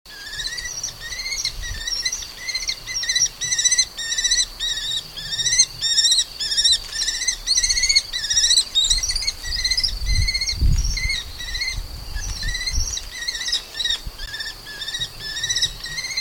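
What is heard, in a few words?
Falco tinnunculus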